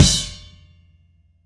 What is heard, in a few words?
Happy Pub Beat Outro, Indie Rock; Sonic Foundry (Sony Creative Software); Indie Rock Anthems; Session 05 - Happy Pub